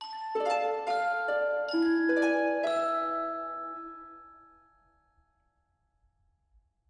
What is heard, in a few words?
Lose; Soraya